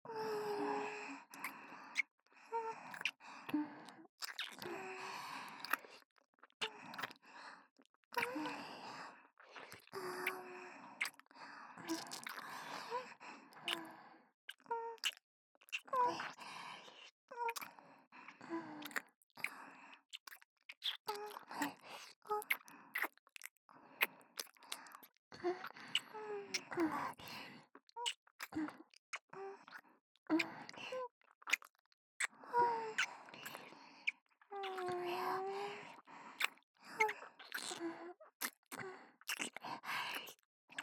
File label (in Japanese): Wキス; おまけループ（オナサポ）; キスプレイ